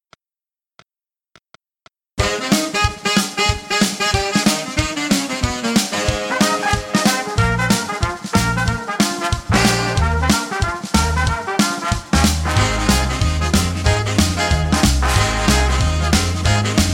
Party Song Swing n Jive G, backing track

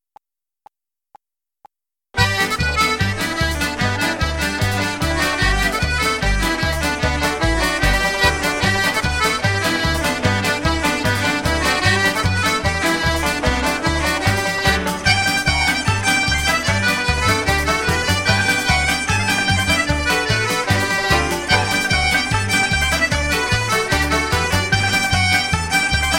Traditional Irish Fast Polka G

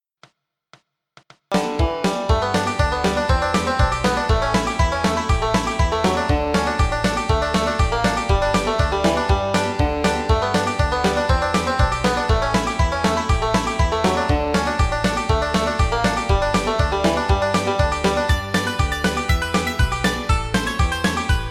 Bluegrass Salty Dog Banjo fiddle G

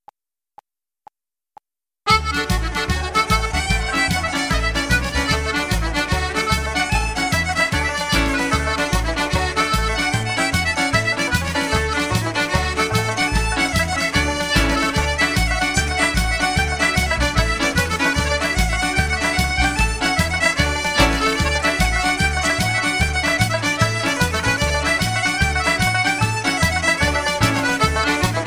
Traditional Irish Slide D; backing track

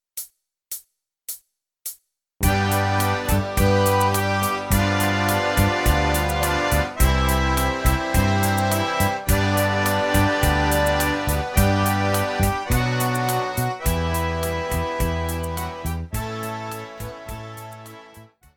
Irish National Anthem; backing track

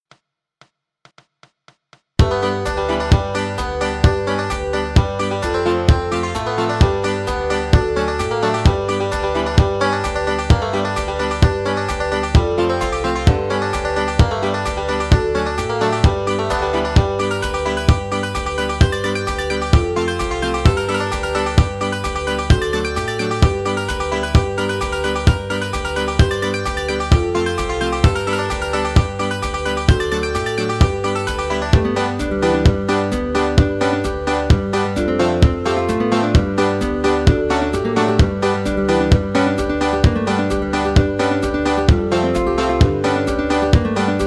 Bluegrass Banjo G; Yonder Stands Little Maggie

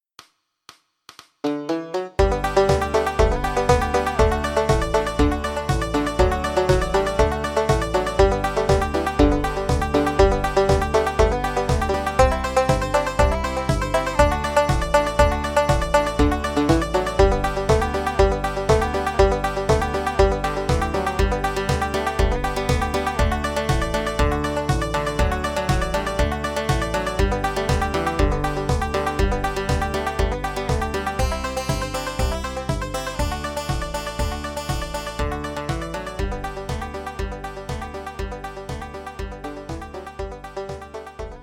Bluegrass Banjo G; backing track

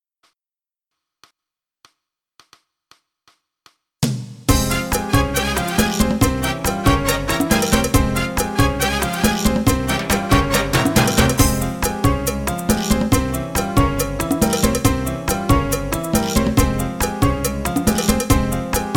Ballroom Medley Salsa G, backing track

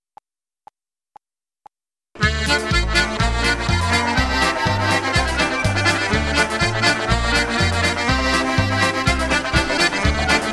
Traditional Irish Reel D; backing track

